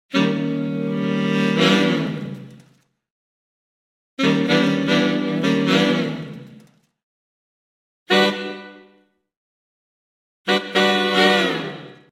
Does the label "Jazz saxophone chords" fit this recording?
Yes